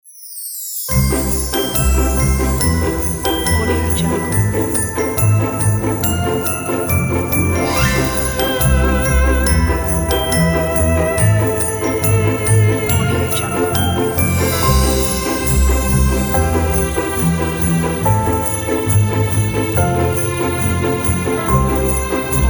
for christmas

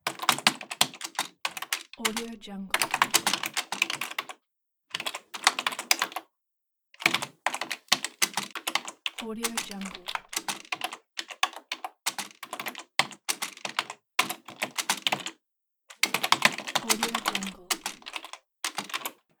keyboard typing

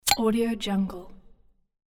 opening beer bottle; audiomonkery

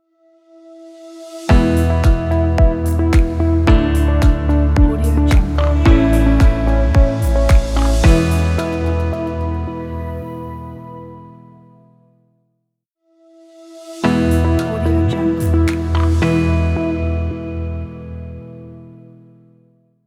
corporate news intro

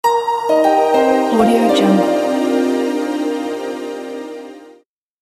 elegant sound logo

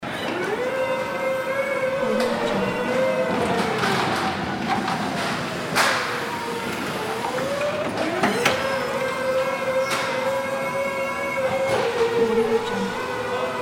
warehouse ambience loop; fascinatedsound

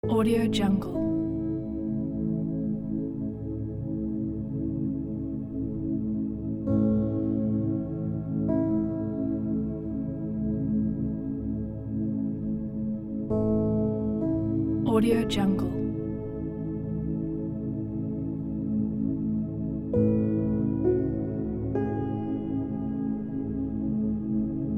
deep piano atmospheric soundscape

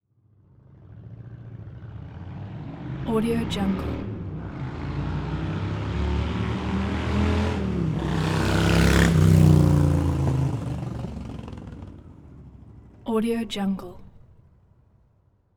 honda shadow fast passby; 2001; soundmorph

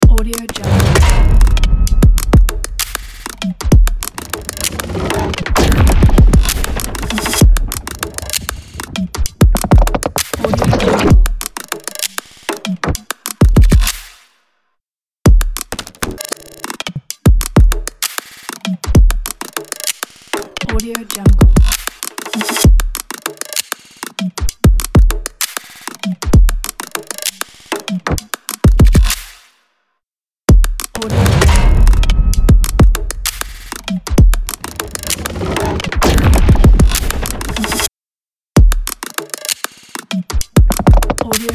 beast glitch logo

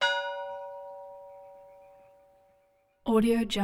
church bells

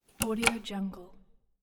cassette tape recorder pause button off

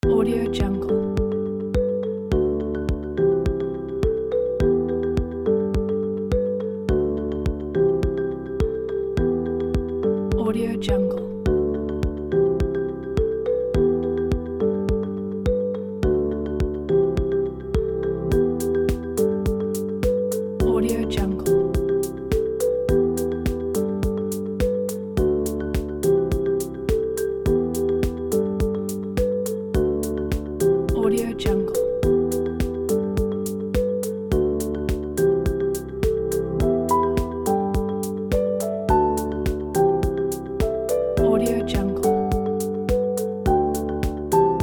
ambient piano corporate

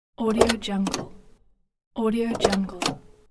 opening the car door

